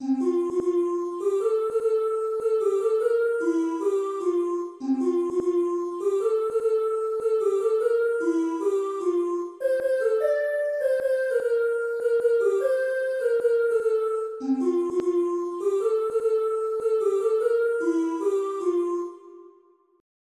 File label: O Tannenbaum from midi